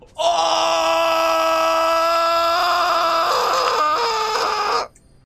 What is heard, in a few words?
cry